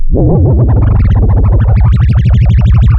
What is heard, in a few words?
Sound of a mud pump